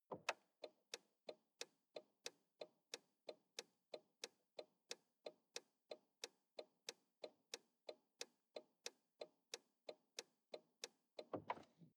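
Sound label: sports car interior blinker long; verkehr transport; autos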